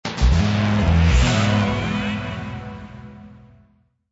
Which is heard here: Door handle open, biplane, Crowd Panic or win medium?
win medium